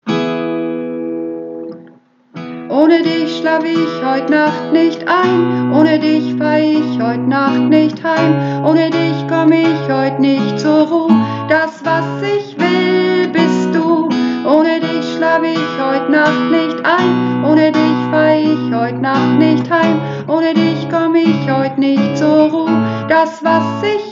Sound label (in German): Hauptstimme, Uebungsaufnahme